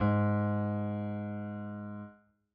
piano g#; Golden Crayon Sounds

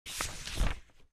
Scroll Page; Early Business sound pack beta 2

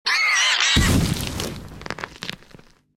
hog death; Original TK Sounds

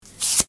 draw card; RS Games Sounds; Exploding Kittens

